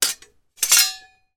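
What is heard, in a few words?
warfare sword hit another then scrape release; zapsplat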